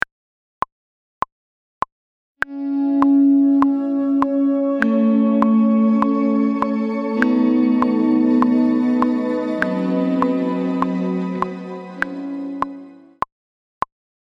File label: no guitar